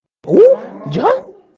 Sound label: uuuh ya